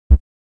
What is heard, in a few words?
Tristar, synth lead